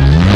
fart short

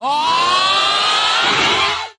"ah", Voice

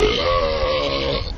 burp medium

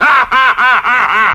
laugh evil

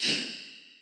egg, Shaker

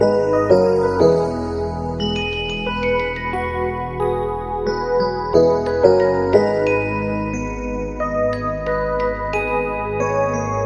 keys, Phonky

